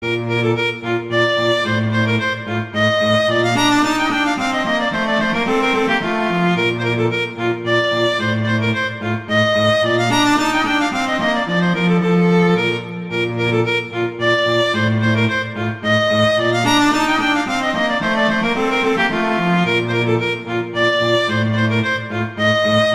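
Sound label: Duet, horn; Mozart; Duets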